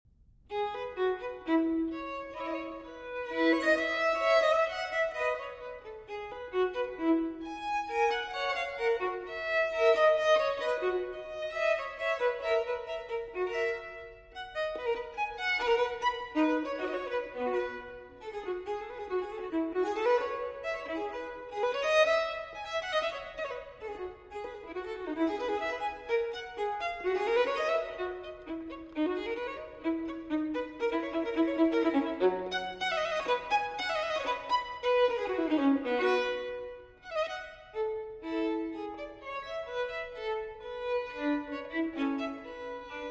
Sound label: Solo Violin in F Major Un Poco Vivace; No. 11; TWV 4024; Telemann Fantasia